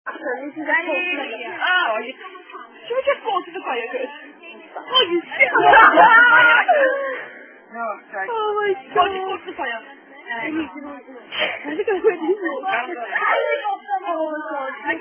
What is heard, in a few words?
Children Playing; Flaming Arseholes; Burning Assholes